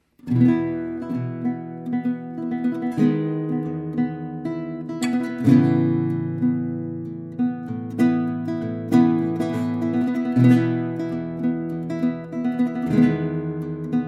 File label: guitar